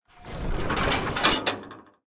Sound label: rocket reload end